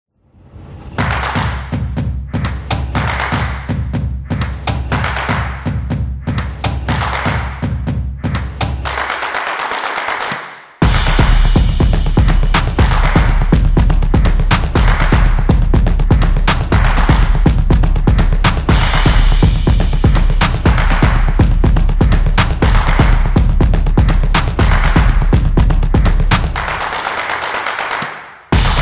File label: Energetic Drumming